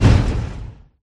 Bump; Teen Titans go! H.I.V.E. 5 Robin Vs See-More

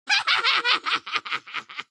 witch cackle; run-dorothy

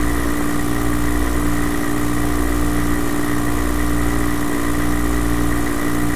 Motor; Teen Titans go! H.I.V.E. 5 Robin Vs See-More

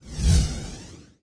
sfx girls fly away; The Powerpuff Girls - Trail Blazer; NextlevelGames

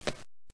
fall; Zombie Smasher

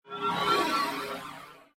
boss lich teleport in; Adventure Time - Break The Worm; ThrillGames

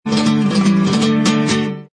Level Start; The Adventures of Puss In Boots - Catch The Thief; NextlevelGames

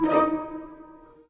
music swipe stab blocked; run-dorothy

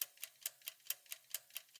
sfx over timer loop; Dorothy And The Wizard Of Oz - Cookie Magic

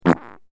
bad collect; Alvin and the Chipmunks - Holiday Hurry

